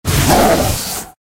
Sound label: Tiger